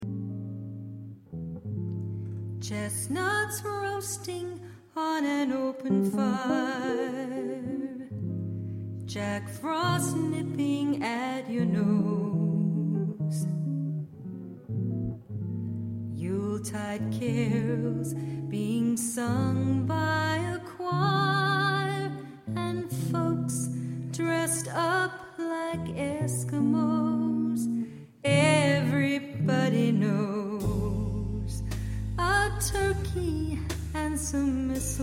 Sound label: Christmas Song